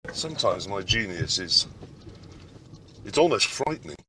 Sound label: click